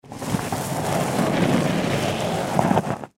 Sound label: Plastic Cooler Drag Over Gravel; Dragging Sound Effects; Objects; Foley